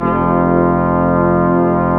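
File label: brass, Quintet; Roland L-CDX-03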